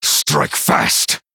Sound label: Male Night Elf Attack